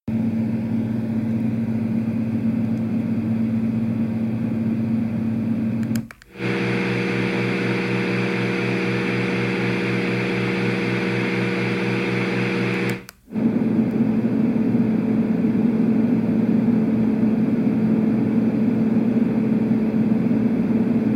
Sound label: Lectrofan Classic fan sound samples